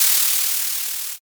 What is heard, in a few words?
tire skid